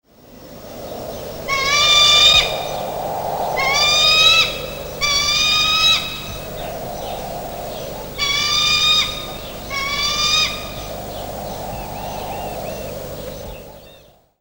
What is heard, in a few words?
eagle over lake; Airwolf